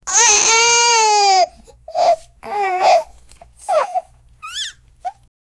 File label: baby does big blowout poo